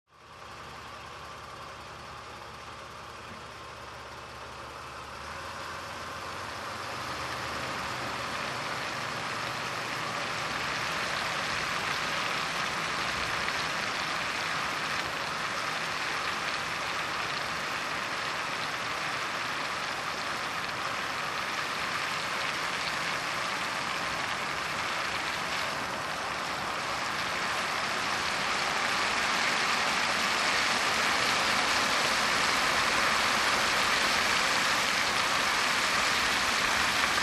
Car driving in the rain

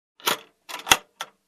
Construction

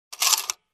Tilting coin box

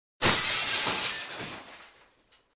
Window Shattering

